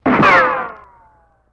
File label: Ricochet, bullet bys & riccos